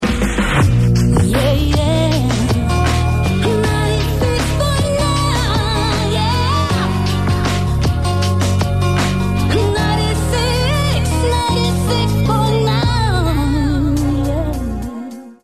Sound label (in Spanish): Guitarra; 96.9